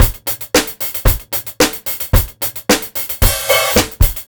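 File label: BEAT 1, 112 bpm, Funk; USB Soundscan vol.41; AKAI; Roots Funk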